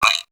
Perc; Coldcut's Kleptomania VOL1

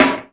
CTRHIT SNARE; AKAI S6000 CD-ROM - Volume 4; Snare 2

